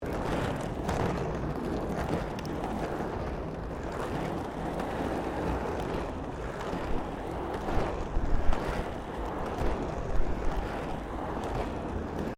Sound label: ROLLERBLADING BITUMEN LOOP; Sports